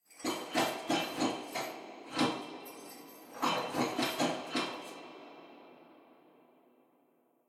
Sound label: walk backwards look phase